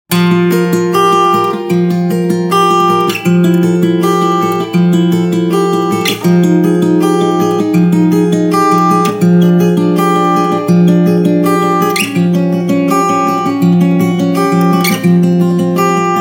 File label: transition